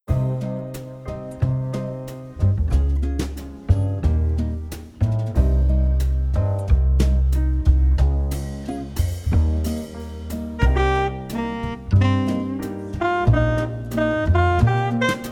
Swing

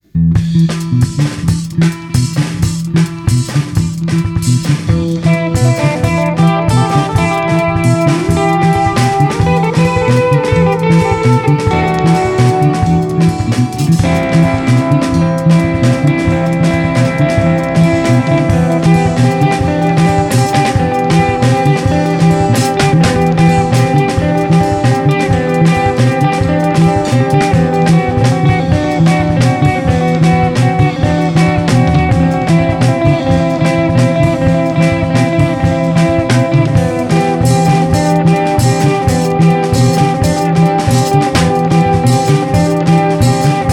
Samba

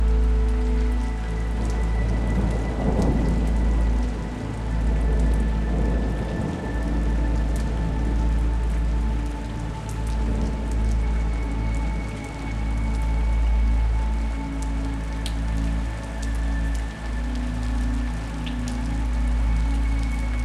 Beautiful Calming Rain Sounds

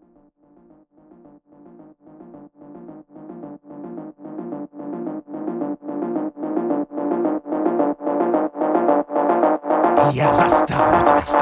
EVIL PHONK

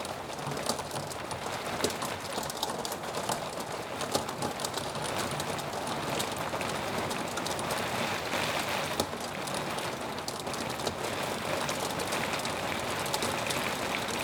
Brown Noise Droplets Fall

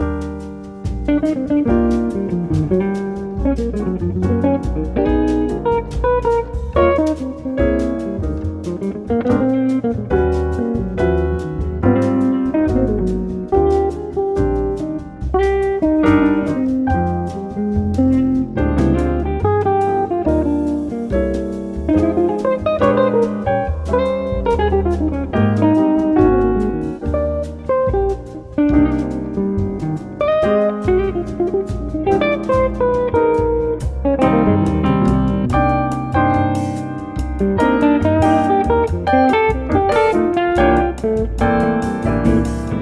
Bossa Latin Lounge Jazz

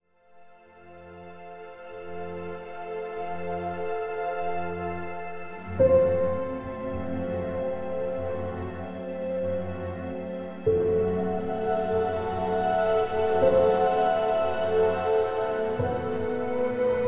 Piano

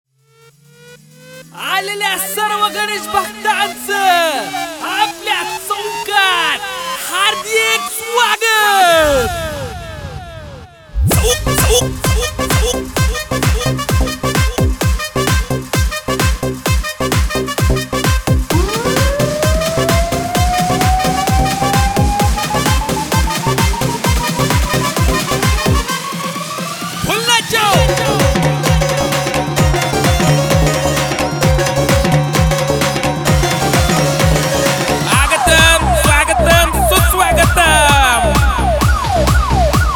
DJ Song

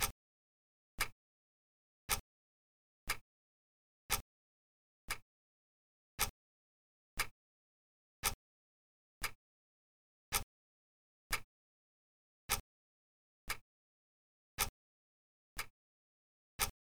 Clock Ticking

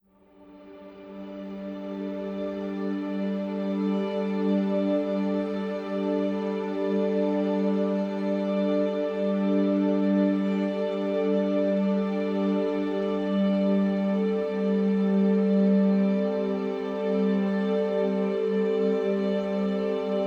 Spa Sleep Music

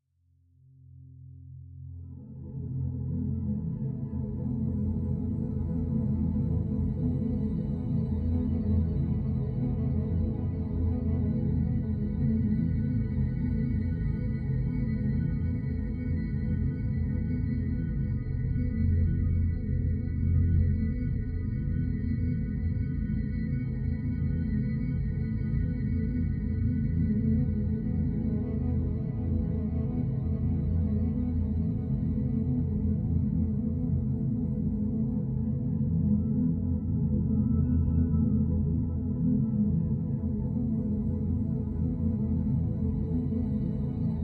Yoga Music, Rainbow Waves

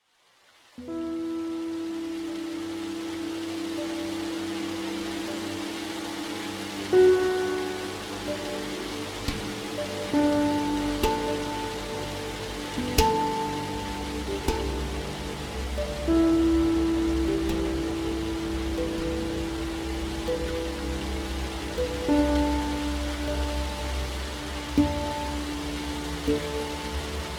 Relaxation Ambience

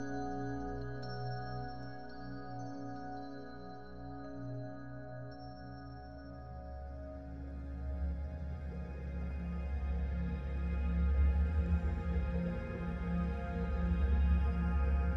Celtic Harp

